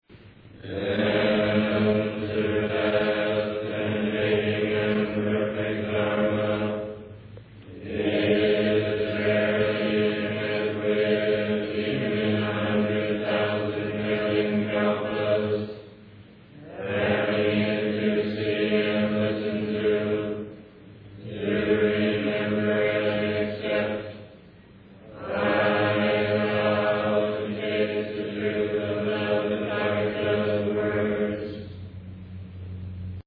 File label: chant